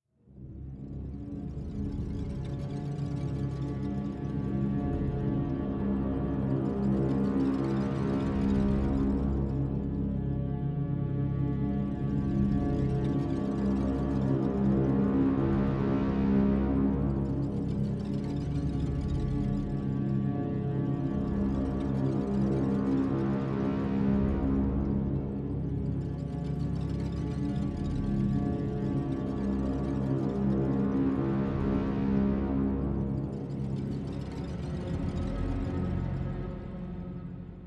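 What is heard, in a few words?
ambient orchestra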